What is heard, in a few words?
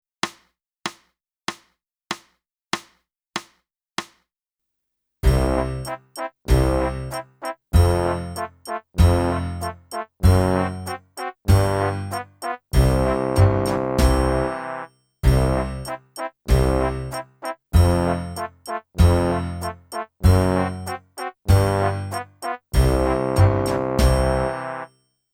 flute; Boosey Woodwind Method: Flute (Book 1)